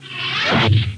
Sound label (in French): cracheur; Arc En Ciel Et Le Lagon Merveilleux; French Version